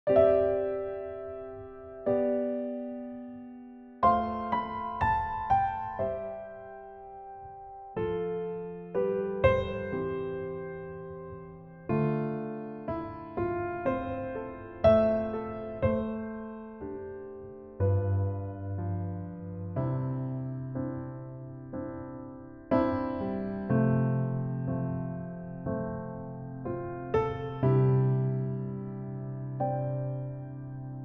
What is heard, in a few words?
piano; key - C - range - G to C